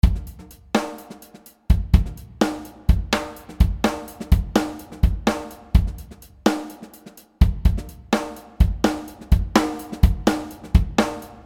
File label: jazz fusion rythme